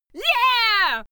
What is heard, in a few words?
female cheer yeah